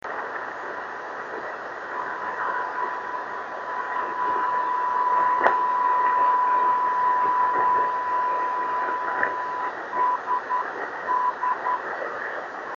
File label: 28070KHz